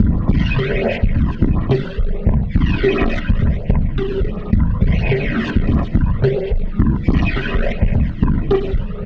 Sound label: Sweep Loop; Sonic Foundry (Sony Creative Software); Esoterik Beatz